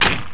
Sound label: trucks; GRSITES Graphics